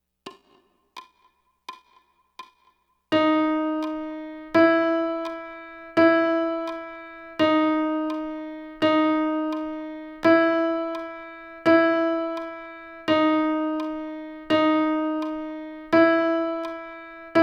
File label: Playalong Chromatic Trainer A Flute full range, bansuri; playtalong tracks to train the chromatic notes on the bansuri